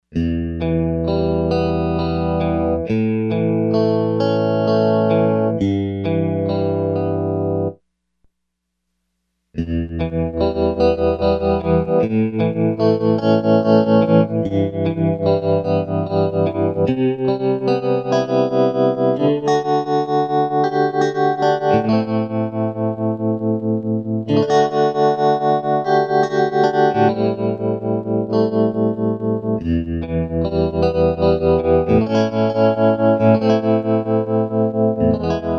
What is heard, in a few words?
TREMOLO